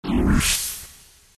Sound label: splash